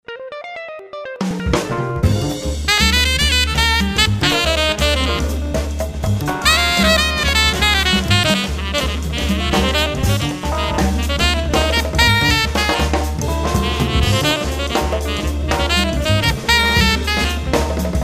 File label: Jazz Bar; Samsung; SGH-T356 Elevate